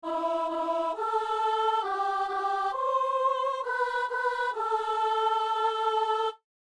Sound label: soprano; Psaume 26 (27); Messe du 17 janvier à Saint-Denis